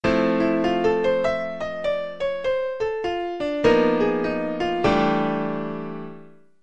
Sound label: Bebop 2 5 1, piano